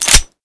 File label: Deagle Slide Release; new weapons; deagle tiger; cstrike